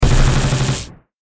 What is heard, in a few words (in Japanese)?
マシンガン